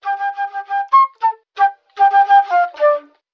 flute frenzied, Loops Phonky

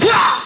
"ha", Voice

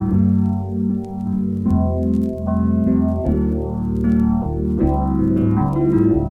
keys interlude

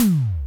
Toms Electro

